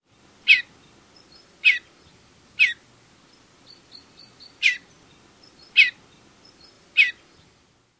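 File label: Mountain Bluebird, juvenile calls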